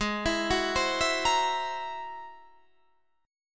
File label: G sharp Augmented 9th, Guitar, Half Step Down